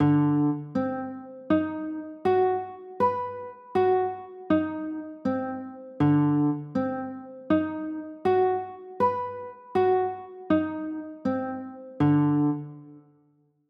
d 13 b9

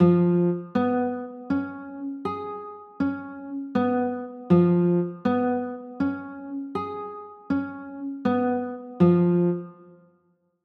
c sharp 6 9, chords

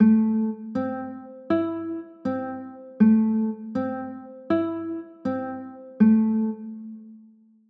a m, chords

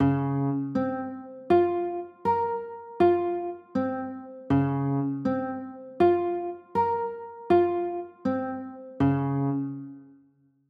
d flat maj, chords; d flat maj13

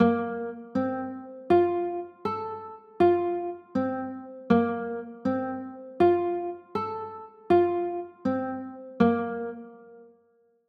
a sharp maj9, chords

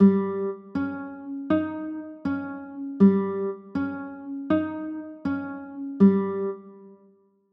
e flat 7, chords

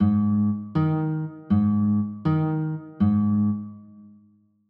a flat 6

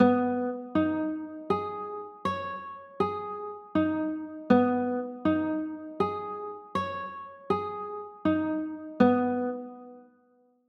c sharp 6 9, chords

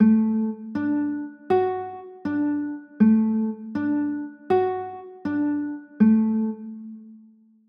d maj, chords

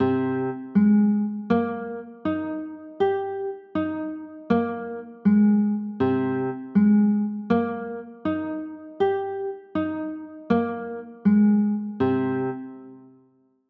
f m11, chords

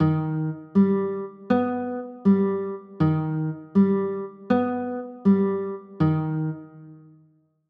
g aug

